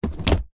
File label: tub plastic lid on; foley